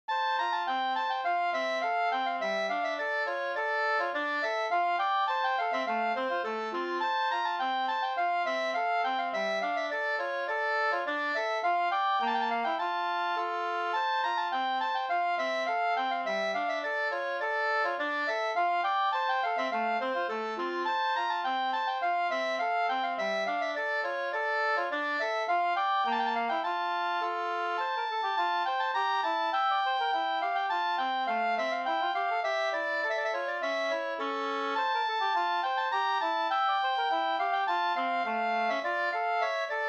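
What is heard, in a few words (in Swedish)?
slangpolska, two-part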